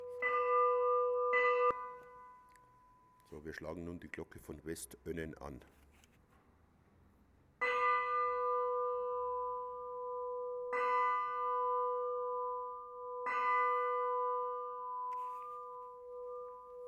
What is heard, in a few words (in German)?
glocke